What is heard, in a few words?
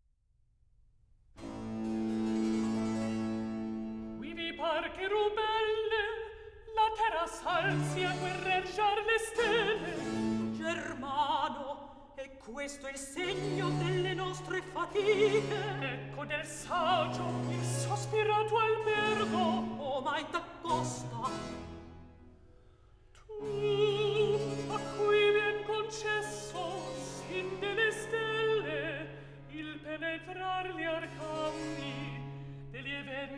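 Recitativo, Handel opera; Act 3; Quivi par che rubella; Handel - Rinaldo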